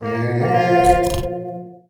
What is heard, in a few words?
summon boar; hero cronan